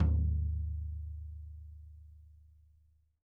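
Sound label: floor tom; 90s sample CD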